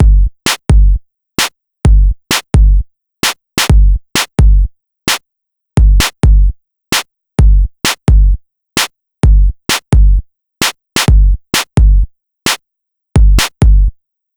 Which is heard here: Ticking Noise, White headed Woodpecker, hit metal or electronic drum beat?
electronic drum beat